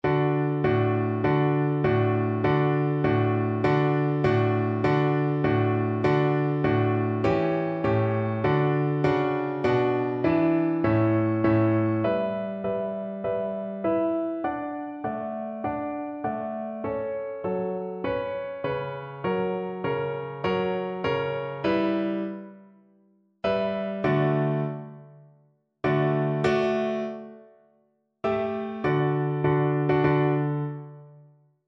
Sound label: Double Bass